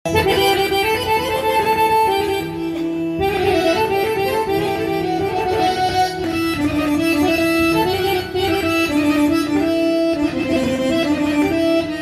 Garmon

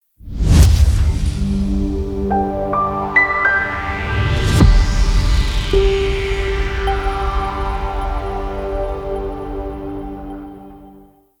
impact piano logo

